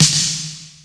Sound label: NICE DANCE S, DANCE KIT; Crypton - DrumBase5000; DANCE KIT #3